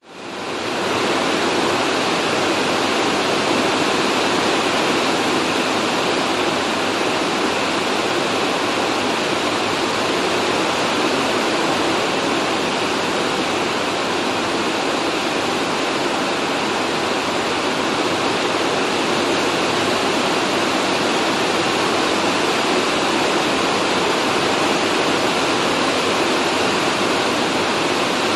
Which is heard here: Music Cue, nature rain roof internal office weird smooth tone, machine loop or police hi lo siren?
nature rain roof internal office weird smooth tone